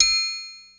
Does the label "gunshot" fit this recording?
No